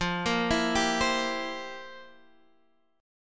F Major 7th Suspended 2nd Suspended 4th, Guitar, Drop D; x,8,8,9,8,8; down; chord shape